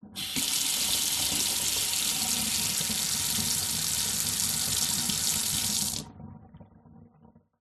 household faucet on water run off short metal sink